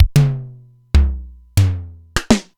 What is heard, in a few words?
Simmons SDS-9 Factory